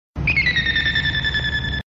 Warning Beep; Gundam

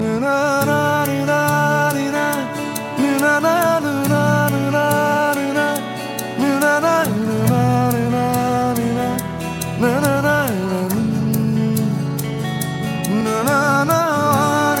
soothing guitar; ringtones

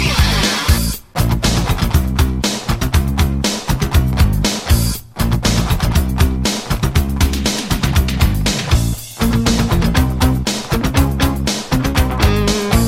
smooth guitar; country

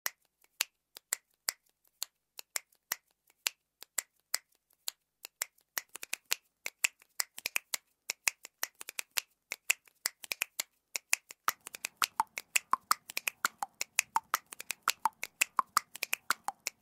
Special Clap

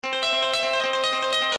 high score sms; games; ringtones

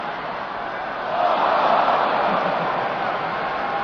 Crowd ooohing

